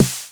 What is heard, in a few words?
XV Snare, Roland XV-5080